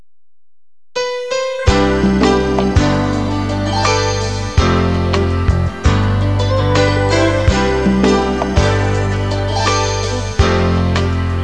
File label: Music; Barely 18